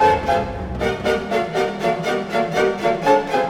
Orchestral Loops; 128 VERTIGO

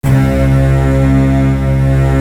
JD STR, STRGS

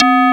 digi bell C4

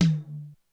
Hi Tom, Korg DSS-1 Drums

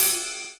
Ride; Equipped Music; Loungin House

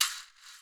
SHAKKA, Ethno Techno; ILIO - Ethno Techno

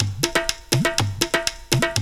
megabass; AMG - Now CD-ROM (Roland); Megabass 2

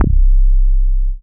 DM BAS, Dance Mega Synth

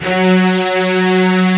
STR SEC F#2, Strings; STR SECT #2

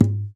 Gtr Knock; Mo' Phatt; Digital Sound Factory Mo' Phatt

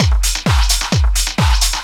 drum 130 trance; Techno Trance Essentials; DRUMLOOPS

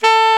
SAX A. MP A, Alto Short; Roland LCDP07 Super Sax

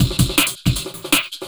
Beat, Drum N Bass; Beats; Sonic Foundry (Sony Creative Software); Knutrix Drum & Bass

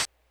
hihat; Big Fish Audio; Hardcore Breakbeat Action

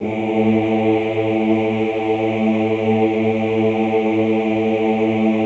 OOH A1, Classical Choir; Best Service; Extended Classical Choir

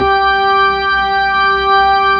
B-3, Full Xfade

